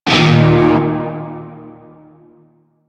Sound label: Shawzin Chromatic Chord G; Music; Warframe; Narmer Shawzin